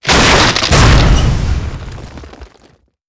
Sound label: telephone pole fall; events; Portal 2